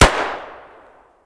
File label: grach fire outdoor distant; Escape from Tarkov